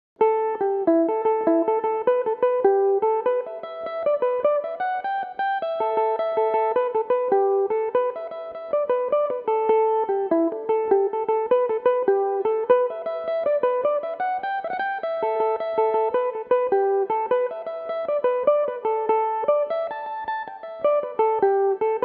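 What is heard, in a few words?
A dorian, Irish mandolin; Lilting Banshee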